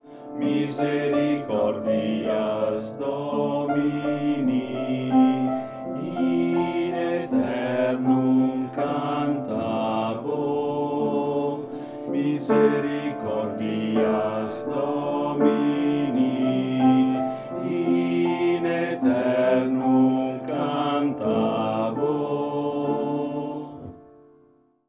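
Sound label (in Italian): Misericordia Domini, bassi 2 voci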